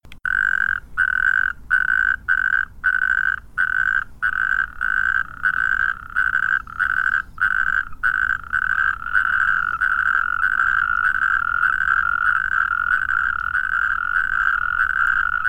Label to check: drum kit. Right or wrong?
Wrong